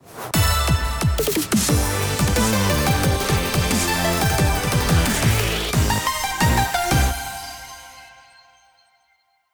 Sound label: Boss Floor Clear!; Splatoon 3; Side Order